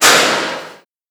Bear Trap Trap Layer Mid; Bear-Trap elements; wildscape

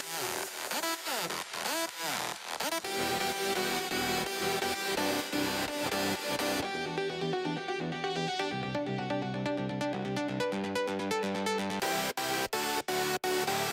Synth

